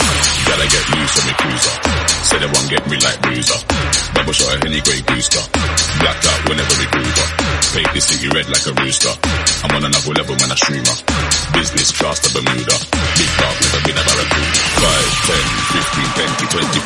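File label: Tech House 130 bpm D minor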